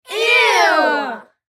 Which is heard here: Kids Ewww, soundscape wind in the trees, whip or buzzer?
Kids Ewww